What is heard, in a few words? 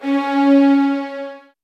SOFT VIOLINS; Q Up Arts; Denny Jaeger Master Violin Library